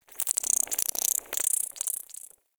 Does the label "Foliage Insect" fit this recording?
Yes